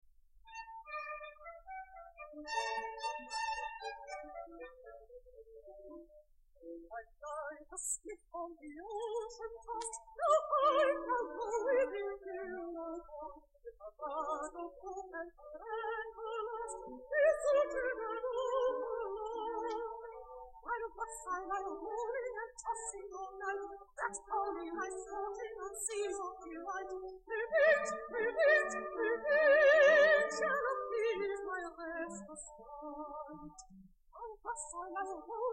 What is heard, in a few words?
air